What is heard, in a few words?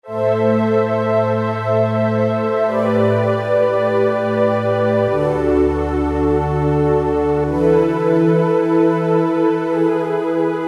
Whisper Voices, Korg Wavestation A-D